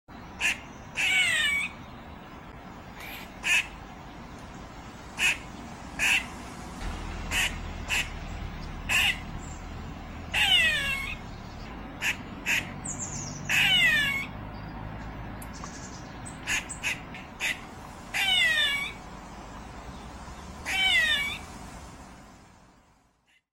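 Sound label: Squirrel squeak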